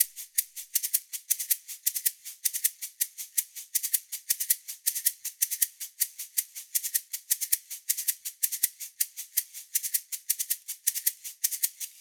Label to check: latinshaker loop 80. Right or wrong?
Right